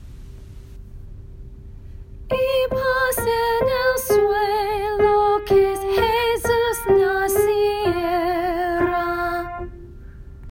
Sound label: solo